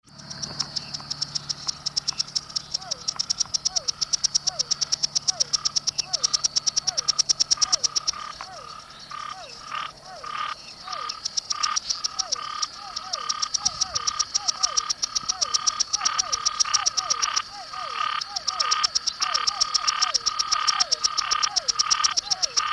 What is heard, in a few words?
Scinax Argyreornatus